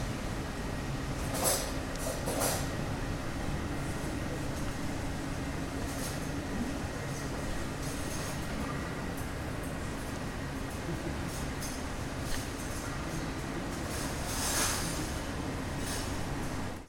Küche Gasthof

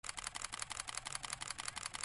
kameraausloeser serienbild

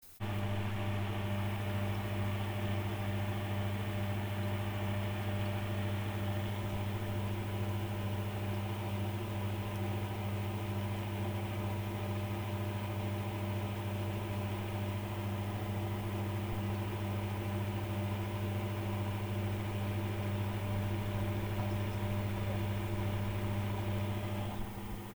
kuehlung

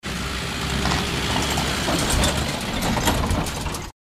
Laster

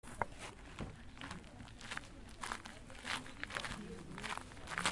schritte im kies

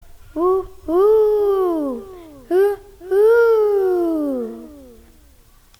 eule

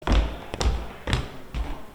fussstapfen

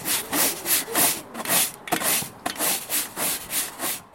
besen auf holz

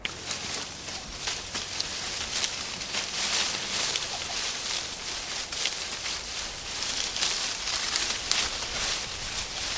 Blätter rascheln

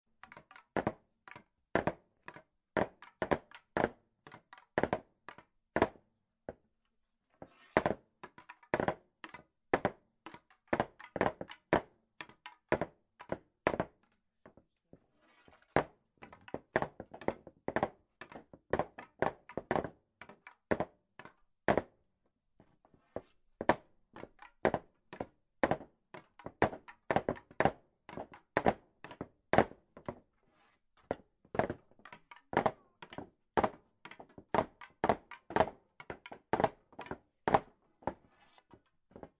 impro-instrumente holz lang

